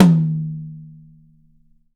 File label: YAM 8X10 RO R, Drums, TUCSON KIT; Big Fish Audio; Ross Garfield Drums VOL-2